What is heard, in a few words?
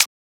Closed Hat; FLPRO